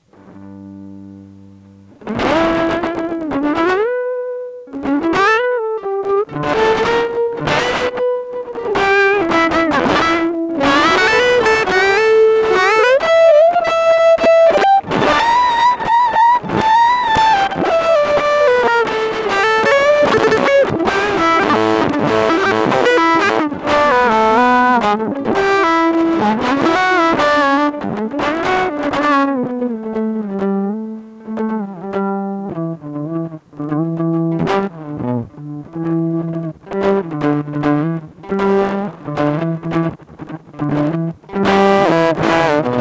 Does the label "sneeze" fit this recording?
No